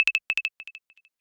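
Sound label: percussion alert; fvtt sonniss gdc audio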